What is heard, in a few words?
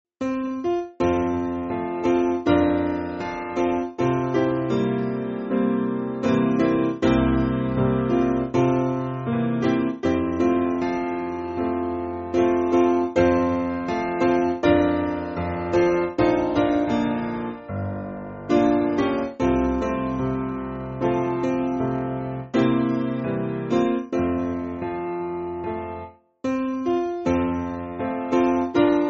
Piano, church music; I Can Hear; Norris